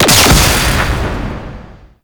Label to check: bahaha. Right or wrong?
Wrong